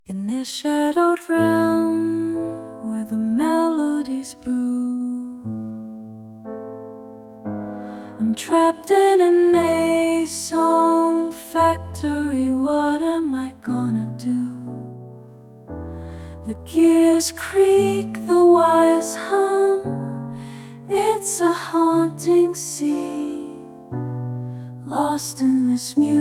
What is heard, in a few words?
Midnight Groove